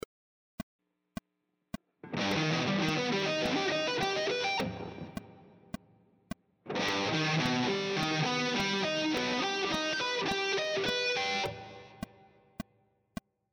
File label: triad arpeggio sequences, guitar